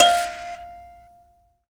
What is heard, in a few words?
PLK BUZZ, Buzz Kalimba, Africa